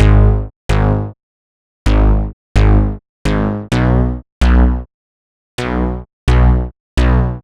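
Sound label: Synth bass, 129bpm, Nu Dance Electro; Zero-G - Soundclash; Dead And Alive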